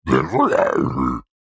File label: cartoon monster gremlin troll crazy grunting vocalisation; zapsplat